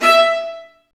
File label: STR VA3JE1DL, Roland LA Composer strings; Roland - LA Composer vol. 1